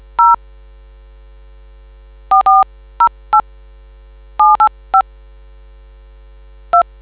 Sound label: dtmf